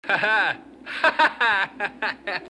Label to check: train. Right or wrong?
Wrong